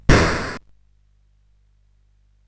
sid CSG8580R5

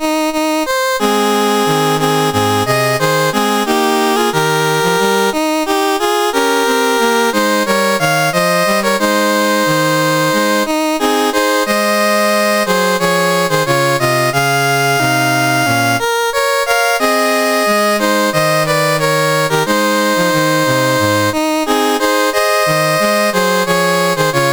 CSG8580R5, National Anthems; Perak

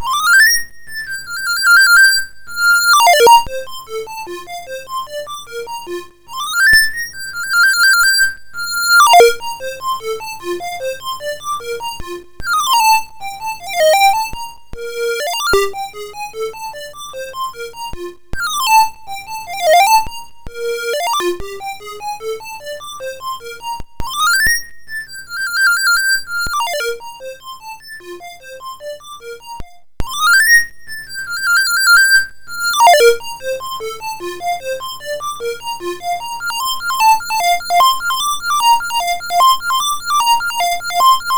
Chinese Dance, MOS6581R2

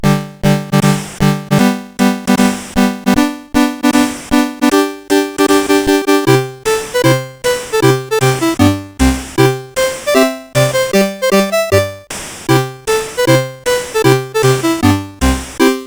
Fireworks Celebration, CSG8580R5; Complete Computer Fireworks Celebration Kit

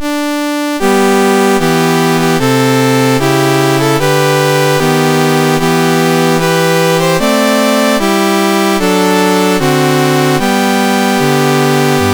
MOS6581R4, National Anthems; Trengganu

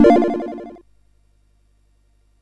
Game Effects; Work-GameEffects; CSG8580R5

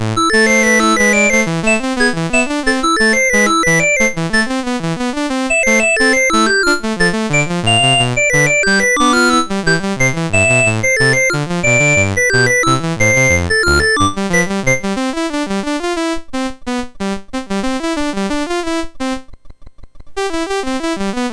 Two Part Invention No13 A Minor, MOS6581R4

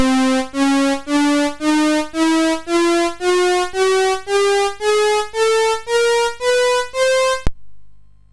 Thirteen Note Scale Demo, CSG8580R5

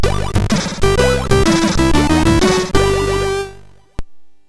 sid MOS6581R4

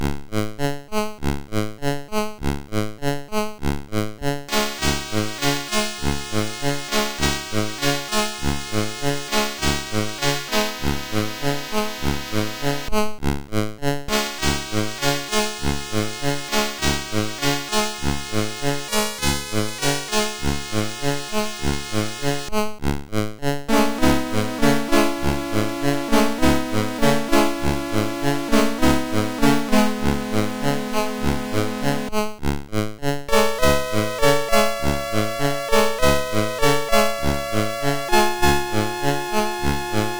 Music, MOS6581R4; Colleen Music Creator

